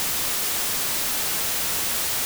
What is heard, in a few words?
pan Power